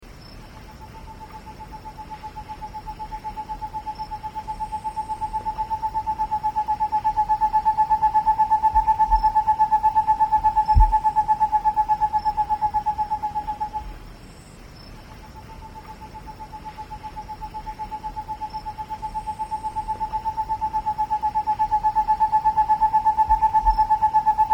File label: Rufescent Screech Owl; A Bennett Hennessey